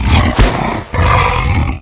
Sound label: vampire grasp